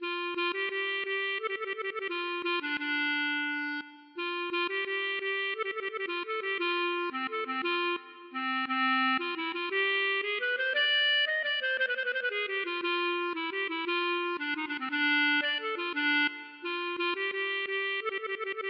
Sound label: klezmer Nigun